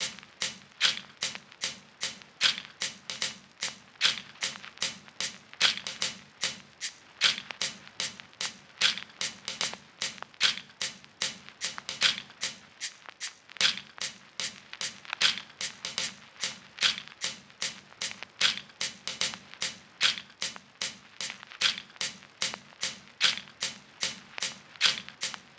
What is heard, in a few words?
tops 75, Drum Sample Pack Loops Top Loops; SD SEISMIC; Slate